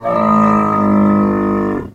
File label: Dromedary Death; Pawn